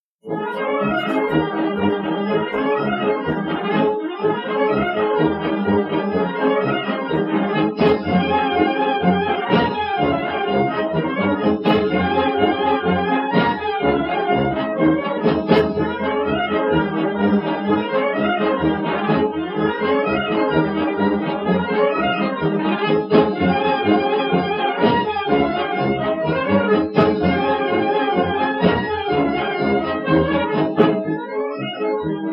start of The Flamborough Sword Dance, noise reduction; Goldwave